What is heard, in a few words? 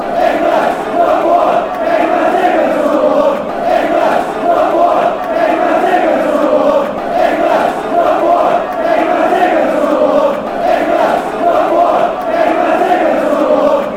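fanchants, football chants; englands number one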